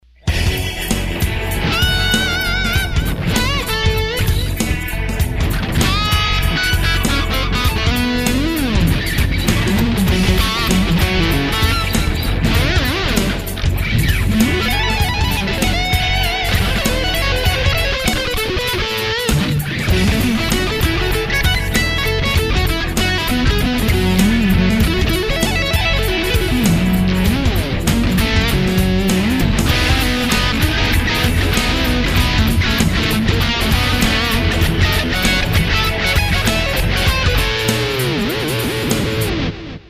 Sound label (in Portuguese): rock improviso